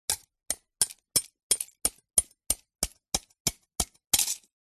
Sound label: ice block chip away with small hammer or knife; zapsplat